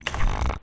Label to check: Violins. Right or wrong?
Wrong